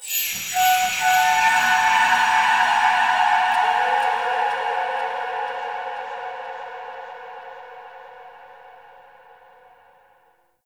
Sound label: Spirit Tree Holy Chatters; Ambience; One Offs; Glades Of Andurin